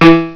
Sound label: piano